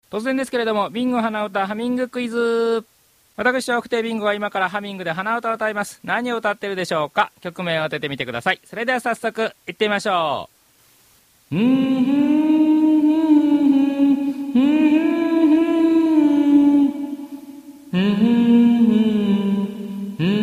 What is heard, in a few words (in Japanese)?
hanauta